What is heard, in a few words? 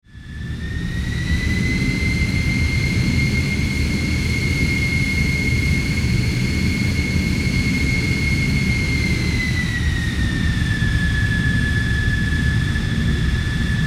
Airplane Jet Fighter In Flight